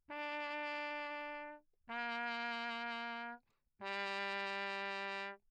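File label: trumpet mute low; Lechner; Engemann; Perinétventiltrompete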